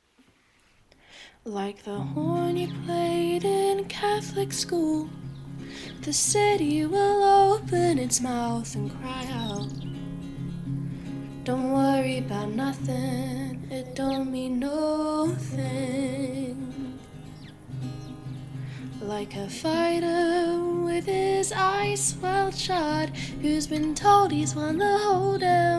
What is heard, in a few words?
calm suburban summer night netherlands